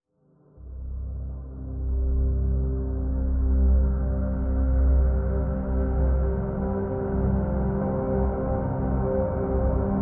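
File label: Drn Dimensional C, drone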